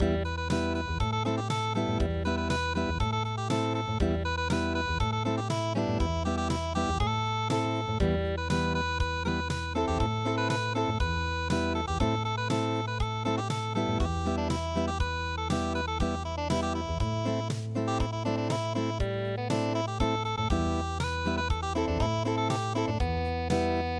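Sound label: hokkai unplugged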